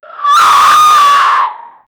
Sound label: a ghost notices you and; ghosts